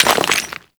Melee Impact Cement; Warframe; Weapons

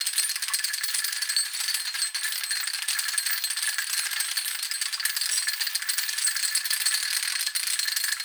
Chain Winch Chain Loop; Puzzles

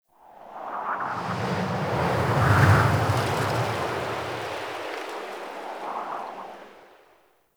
Medium Wind Gust; Wind Gusts

